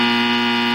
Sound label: error